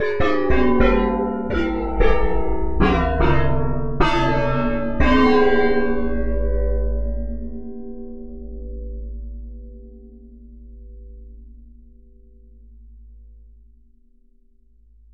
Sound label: cymbals